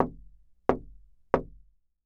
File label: Household Wood Door Knock Slow